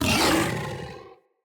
Kubrow Feral Vocal Angry Attack Charge

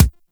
Weapon Punch Pistol; Warframe; Weapons; Pistols

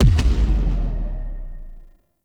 Railjack Thumper Explosion; Warframe

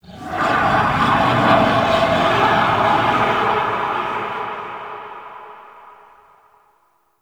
Forest Distant Creature Large; Warframe; Grineer

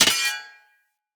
Short Sword Medium Parry Impact; Weapon Impacts; Player; Swords; Combat

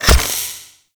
Archer Rain Of Arrows Impact; Warframe; Duviri; Dax Archer; Abilities

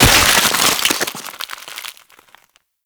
Ice Hammer Impact, Weapons; Warframe; Tenno

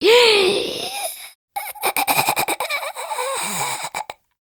Death; Warframe; Corpus Lich; Persona D

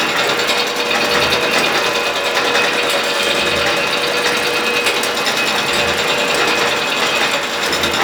Spinning Mechanism Loop; Shadowgrapher; Warframe

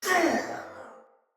Death, Soldier Male; Battle; Sinecure; en

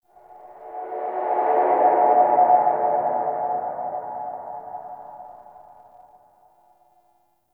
eerie metallic moans; Warframe; Tau

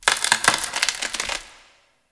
Corpus Infested Glass Crack; Warframe; Ambience

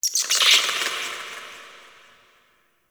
Forest Bats; Warframe; Grineer Forest

